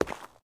zombie footstep concrete; DeadFright

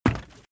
Footsteps - Wood - Creaky - Run - Left

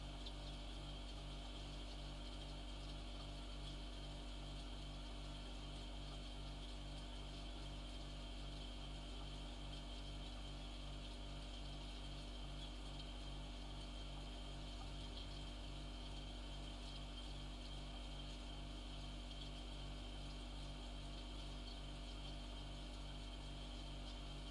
fridge; devices accessories utilities; NS Studios - ultra sound collection

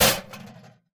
impact trash box; Blind Drive

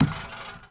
Street Ball Acrylic Back, Street Basketball; SFX Kit

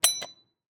Wheelchair Door; Medical; Blastwave

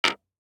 DICE on Hard Wood, Drop, Standard, 3 Three Dice; Samples; ArticulatedSounds; DICE Sfx Party (Extended)

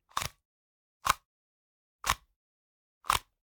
Generic Button Plastic Small

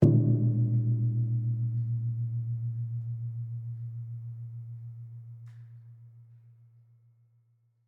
Semi Truck Tanker; Large Vehicles; Blastwave

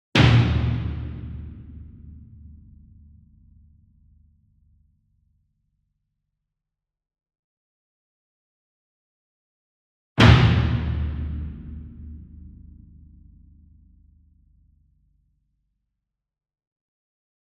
Bass Drum Concert 40 Inch Flam Hard Beater Far; SINGLE DRUM; boom library; Cinematic Strikes Construction Kit

